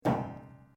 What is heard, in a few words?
RUBBER ON METAL SMALL